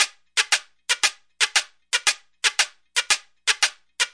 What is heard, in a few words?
wood fish, 116 bpm